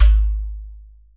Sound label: GHATAM